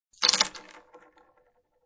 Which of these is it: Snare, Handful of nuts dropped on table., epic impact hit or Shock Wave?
Handful of nuts dropped on table.